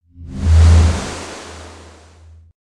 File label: Flyby Clean